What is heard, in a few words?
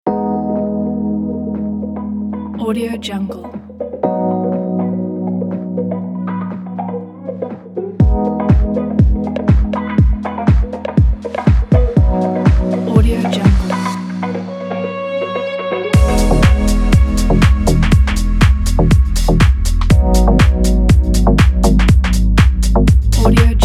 warm lounge; hopesound